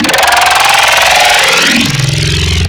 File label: Growl Table, Wavetables; Cymatics; Essentials Wavetables; Serum